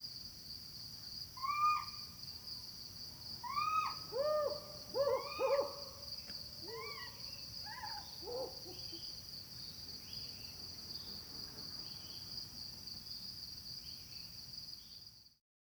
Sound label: Humes Owl Food Delivery With Various Calls Of Male Female; Including Brief Hint Of Pulsed Hootin